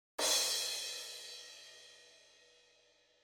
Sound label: crash; Crash Cymbal: Example 2; drum